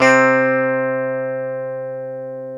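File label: guitar electric 12 string; Club-50 - Foundations; E.12 String; Ricky12Str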